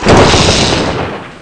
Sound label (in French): Choc Ele